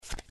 Dealer Down Card flip; Blackjack

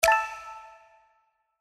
btn Standard settings; slots; Pirate Quest

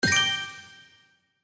Collect; Kungfu Showdown

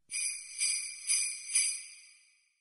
Interactive Symbol; slots; Christmas Blast

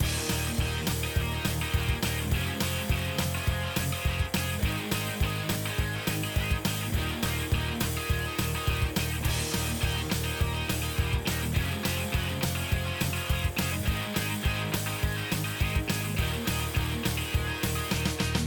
BGM Free Spin, SLOTS; Victory Lane

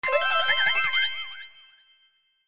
Win Bonus; slots; Robot Vs Monster

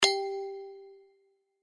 BTN Bet Per Line MINUS; slots; Pirate Quest

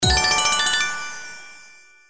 Win Symbol 2x Scatter; slots; Valentine Blast

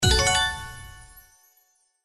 Symbol Regular Win; Aztec Fate

